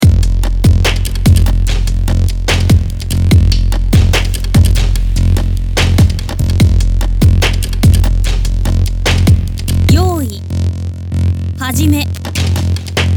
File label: Beat 73BPM